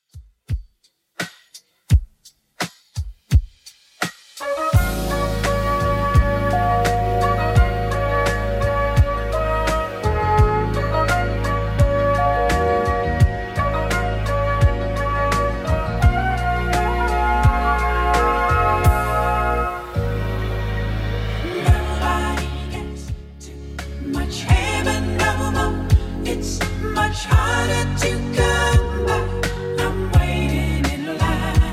70s Redrum 85bpm

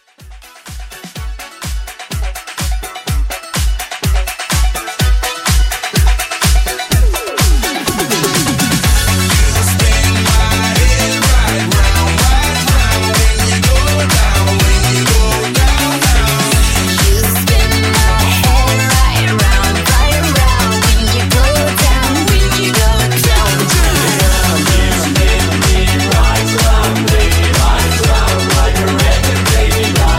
Mashup Rework 125

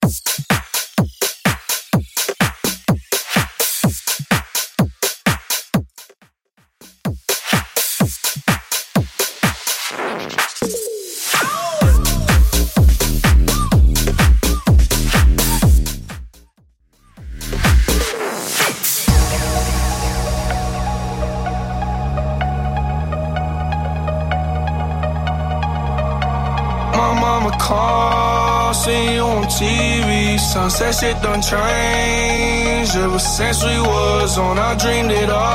RMX EDM 126 Bpm

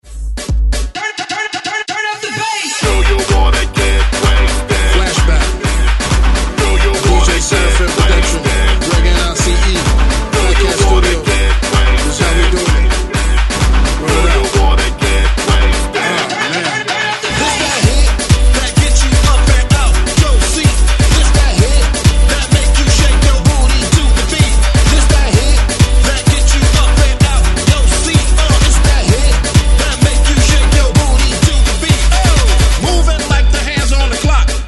Hype Re Drum 128Bpm

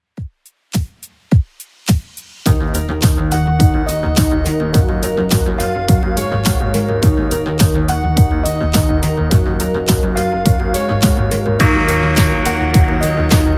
80s Redrum 105bpm

